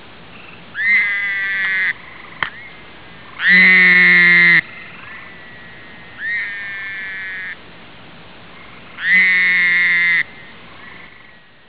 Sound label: fowleri, frog